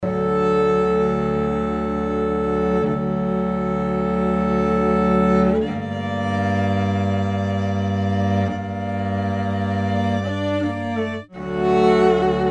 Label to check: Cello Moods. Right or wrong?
Right